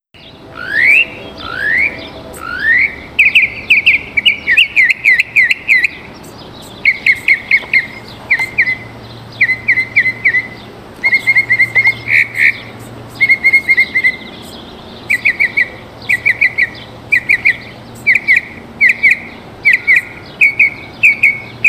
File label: Northern Mockingbird calling